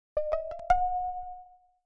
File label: win no star; mr bullet